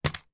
leisure game pick up sticks in box down on surface